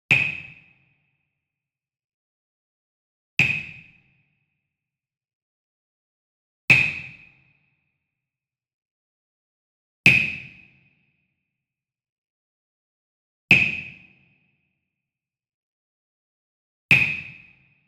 Wood Percussion Wood Block Japanese Resonant Hit Medium; boom library; Cinematic Strikes Construction Kit